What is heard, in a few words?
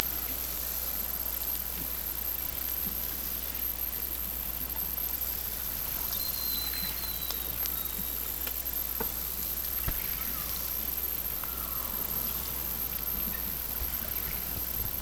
Hydrophones, orcasound